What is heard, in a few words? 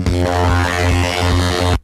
electric; Rumbles